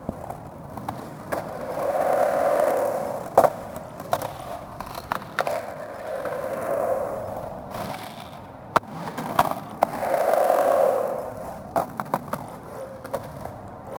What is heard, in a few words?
skateboarding at the skate